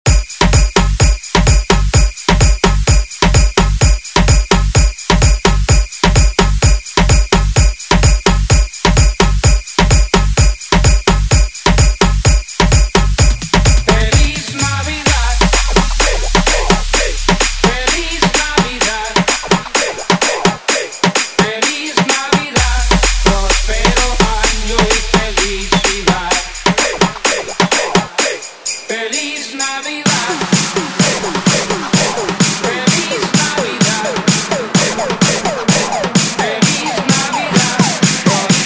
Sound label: Tribal; Deejay Hit